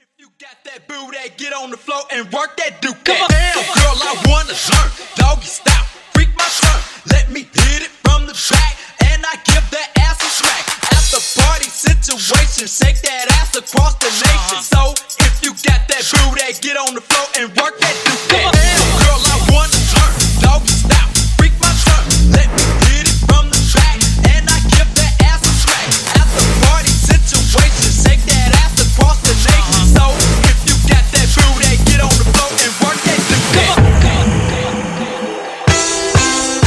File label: Funkappella Intro Breakz Out Dirty 126 Bpm